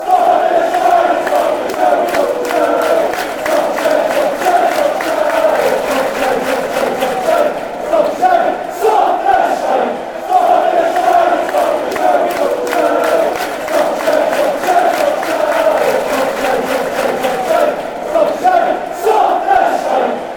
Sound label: soft fanchants